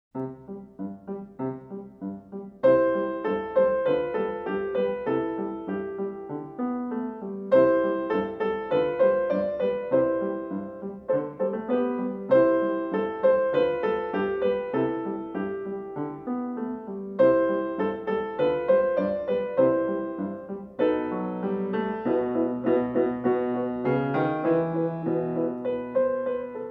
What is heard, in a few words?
piano; The Little Tin Soldier